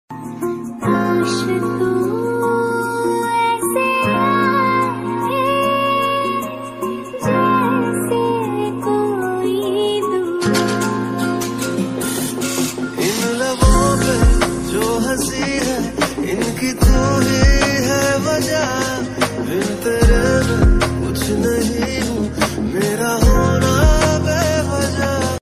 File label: instrumental ringtone romantic ringtone popular instrumental ringtone